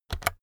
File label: keyboard external key press fast